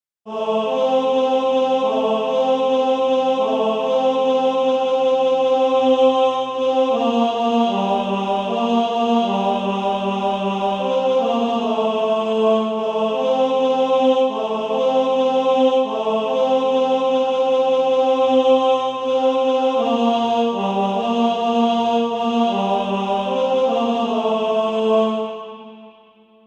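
tenor, Sheet music (SATB) including Practise Music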